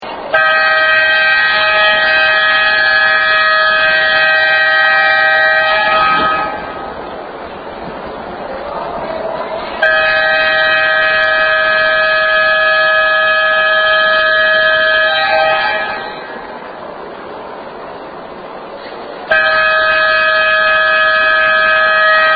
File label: All Clean Sound; ThorGuard